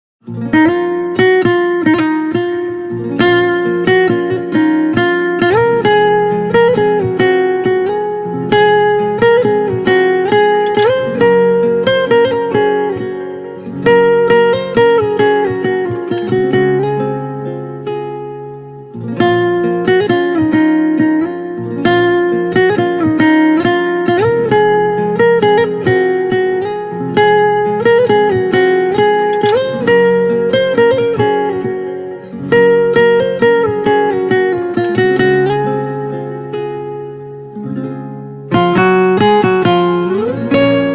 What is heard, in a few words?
guitar